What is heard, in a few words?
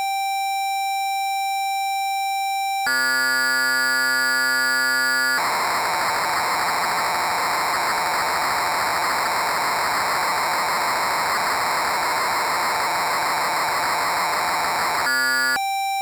korg dw6000 patches